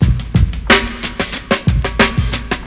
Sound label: snap base loop; World of Amiga; music